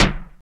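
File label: RX7 FM percussion; Crypton - DrumBase5000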